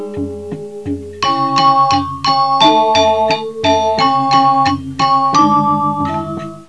suonerie musica ritmica

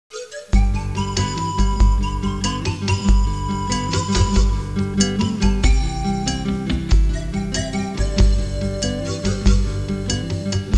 suonerie musica hawaiian

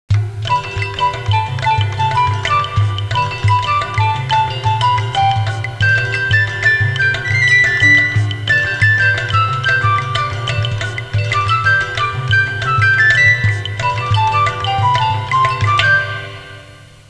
suonerie musica hawaiian

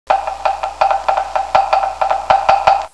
suonerie musica ritmica; rhythm

